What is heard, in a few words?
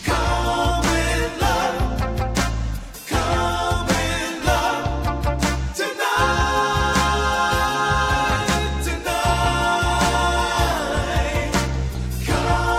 wave